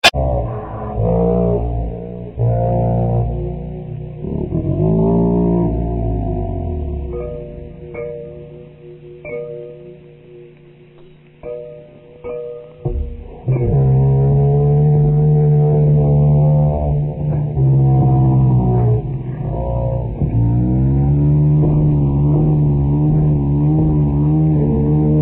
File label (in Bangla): Old Bengali